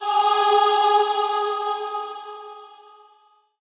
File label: Sound Back Angel beam; Peace, Death